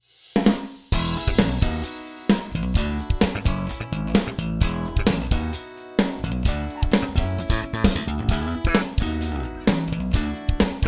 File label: Ensoniq DP Pro Drums Band Medium Room